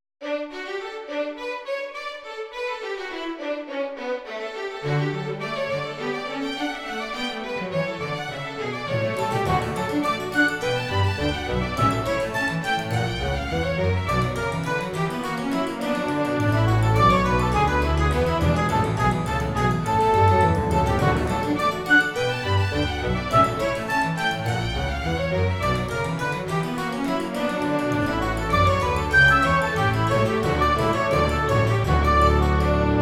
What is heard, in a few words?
Classical